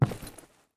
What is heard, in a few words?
wood footstep